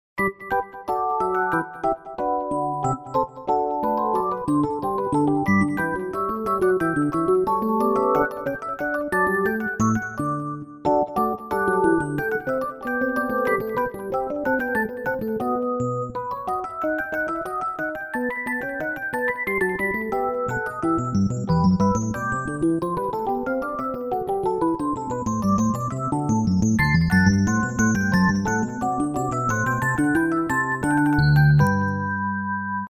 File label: Fugues; ZTE; WP750